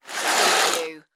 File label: curtain slide